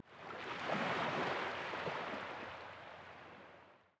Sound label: wave